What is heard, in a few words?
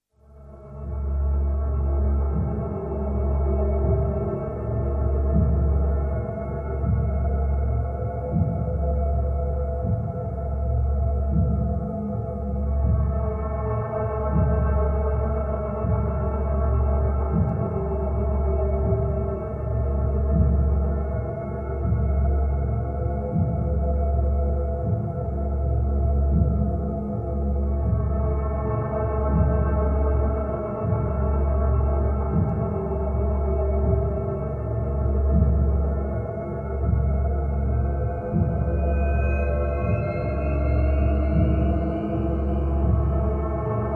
Drones Only